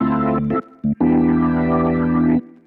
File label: ORGAN 90 A; old rec